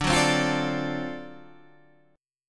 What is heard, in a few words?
D 7th Sharp 9th D down Guitar Drop D